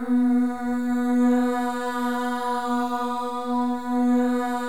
MOVING V2, Symphony of Voices; Spectrasonics

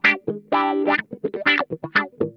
WAH, Funk Guitar; Zero G - Funk Guitar

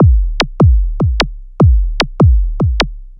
Kick Snare; Sonic Foundry (Sony Creative Software) - Electronic Point Blank

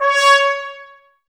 Woodwind Brass Ensemble; Miroslav Vitous

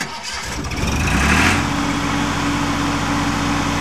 DIESEL ENG, Diesel Motor; SFX

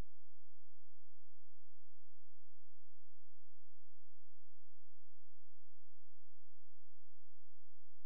C#, Synclav FM; Big Fish Audio; SPACE1

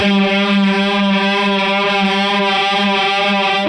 Hondo Fuzz 130 G, guitar drone

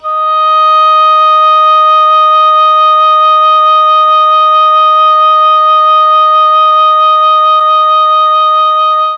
D#3 OBOE, Mellotron; Mike Pinder - Mellotron Archive CD

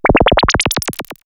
Robot Goobledygook, sci fi synth fx; X-Static Goldmine

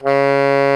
TENOR SAX C#3; Greytsounds VOL-1

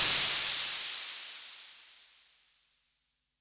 SERGE Cymbal, drums; Polyend; Stazma & Concrete Collage; Concrete Collage Drum Kit; Concrete-Serge Drums

